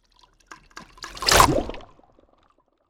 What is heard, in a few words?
Cinematic water whoosh